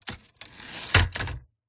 erika typewriter carriage return; PMSFX

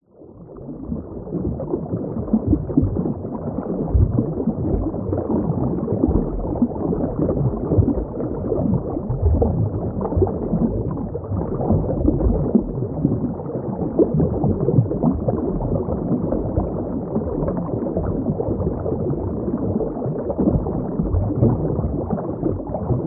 nature water underwater movement flow

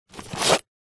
household book telephone directory pick up; zapsplat

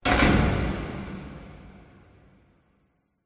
industrial door metal close very large reverb; zapsplat

